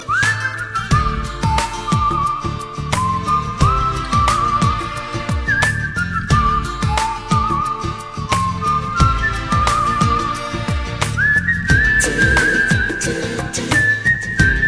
very nice whistle

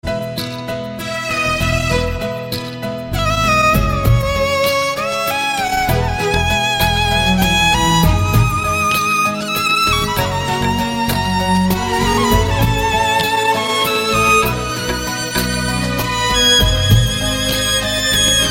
nice tone

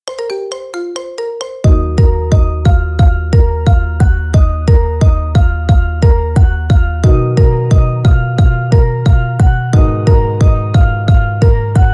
marimba remix

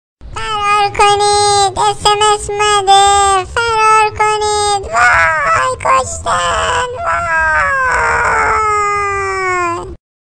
cute child sms

